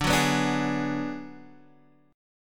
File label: D 6th Flat 5th D down Guitar DADGAD, chord